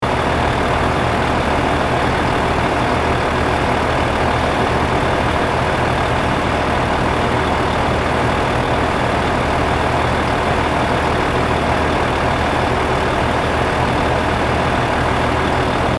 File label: Truck crane engine idle loop